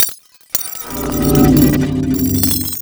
BROKEN; USB Soundscan vol.33; AKAI; Disjointed Textures; BLASTER

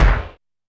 DM5 Kick, Alesis DM5 drum machine

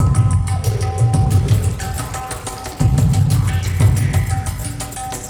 Ambi Groove

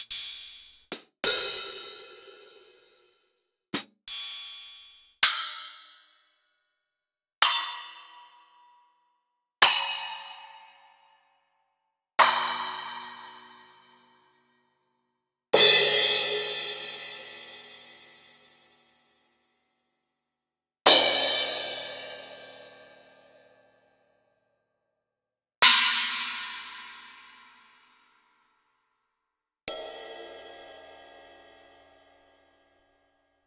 Roland SPD-S1

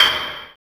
INDUSTRY PRC; TEK PERC1; Drums In Your FACE!

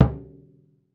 SURDO MUTE; World Combo

